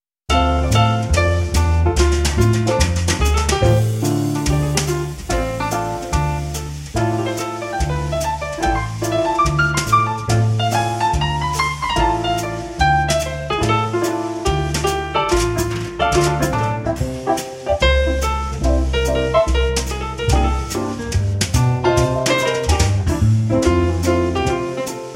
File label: In D Minor, Jazz Ensemble